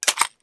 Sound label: g3sg1 clip out; weapons; Counter Strike Model Pack; CS2